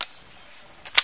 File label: smg clip in, weapons; hl2